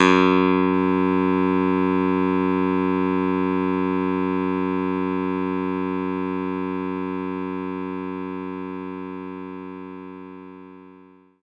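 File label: Clavinet F#2; knights try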